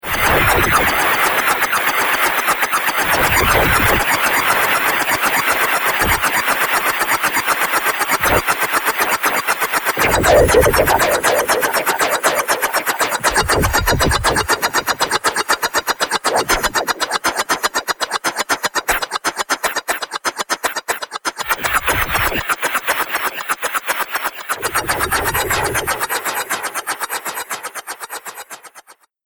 Crazy Syncopated Noise, Science Fiction Sound Effects